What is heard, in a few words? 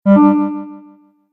Message Alert, beep